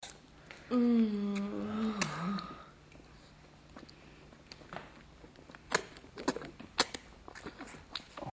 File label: suck, moans; The Witcher Hunt